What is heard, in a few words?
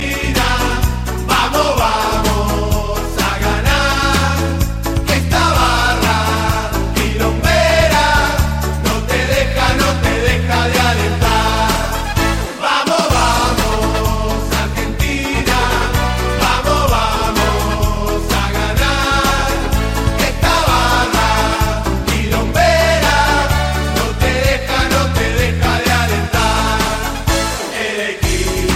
argentina